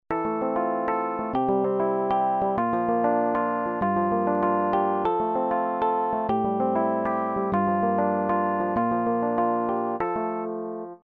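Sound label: rhodes, yamaha tg500; EP: rhodes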